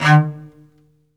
SOLO CE, Strings; ILIO

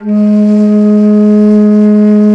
BASS BANS, Bansuris; E-MU Producer Series Vol. 7 – Old World Instruments

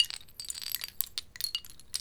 Shell Loop, Ambient Twinkles; Cool Little Shell Loop

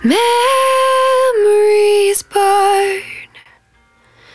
110bpm Ab, Velvet Vocal; Zero-G

